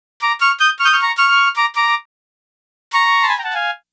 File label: Flutez A#; Lounge; Zero-G Kocktail Kollection; Construction Kitz; Flutes & Swingers 156; 90 s Sample CDs